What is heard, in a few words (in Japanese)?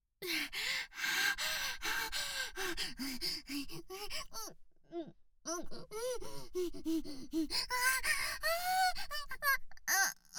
喘ぎ声 リピート; NTR